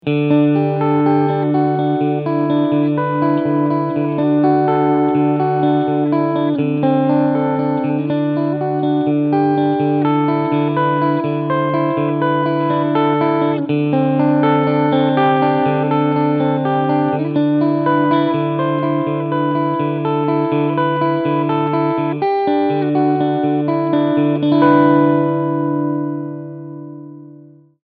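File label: PRS Cleansound Neck Middle Pickup, guitar; Studio Wood Library CobaltBlue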